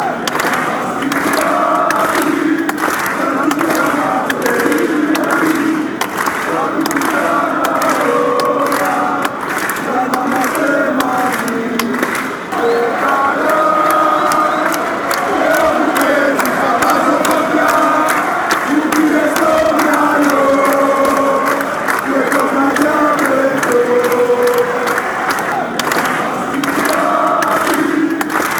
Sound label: olympiakos slow clap chant